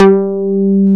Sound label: LOOP, Synth Bass, Roland